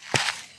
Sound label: sand footstep; minetest; pedology